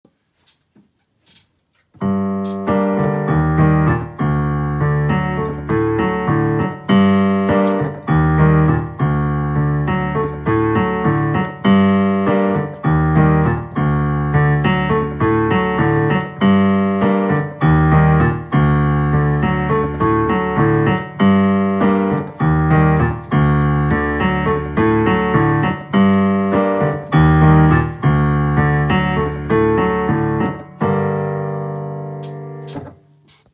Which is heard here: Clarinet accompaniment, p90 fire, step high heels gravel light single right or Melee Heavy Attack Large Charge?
Clarinet accompaniment